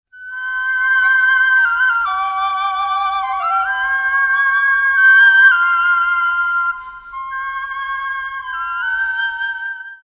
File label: aerophone